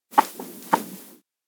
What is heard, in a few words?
glass polish turn away; ken; anemoi パッケージ版 1.1